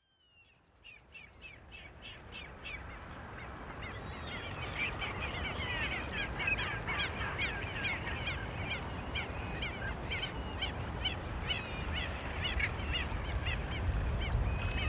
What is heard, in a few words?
Calafate Birds Dusk